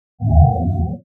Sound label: stone idle